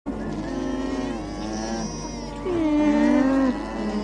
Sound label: lama alpaca